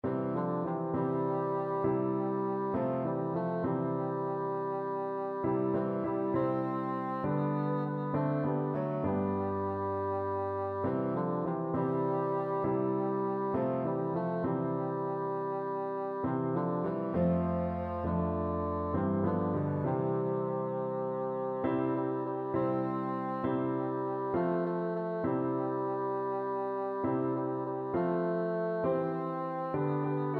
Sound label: blessed assurance, bassoon